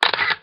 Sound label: Klick, pentax z-1